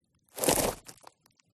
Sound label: Footstep Step Dry Grass Skid Drag; PMSFX